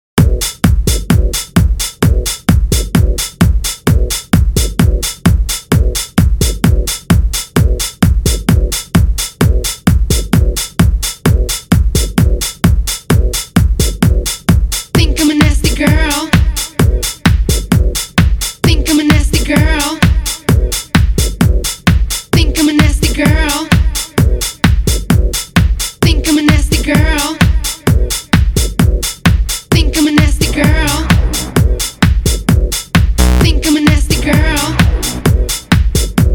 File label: Electro Mix